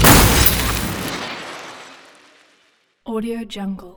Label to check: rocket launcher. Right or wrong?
Right